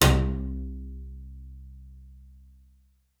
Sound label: C2, bass pizz; Xsample VOL-5